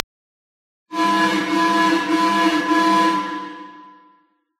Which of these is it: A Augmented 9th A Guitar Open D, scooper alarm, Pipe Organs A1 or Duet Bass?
scooper alarm